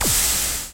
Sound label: sear